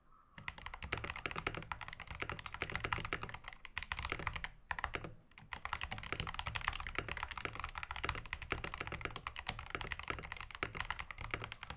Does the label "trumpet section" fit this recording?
No